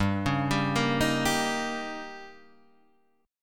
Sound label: Eb 7th